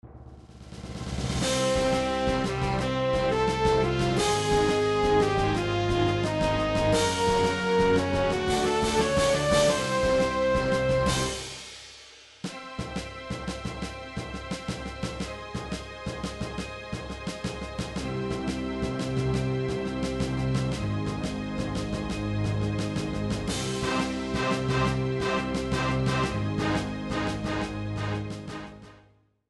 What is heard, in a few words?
hard stages complete; nintendo 64; sequenced; blast corps